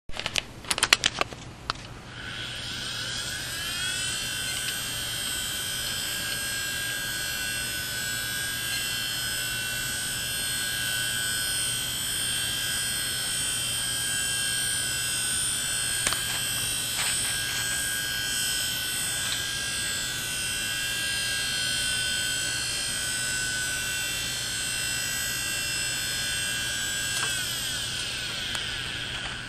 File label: fujitsu mpd3084at hdd